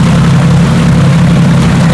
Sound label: cutter; WhiteOut